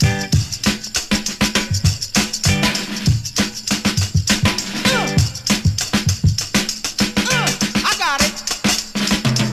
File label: 101 bpm high quality drum loop A sharp key, kick tuned to the A# note; drum loops